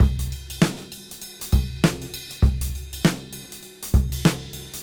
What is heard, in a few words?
Cym Beat 99 BPM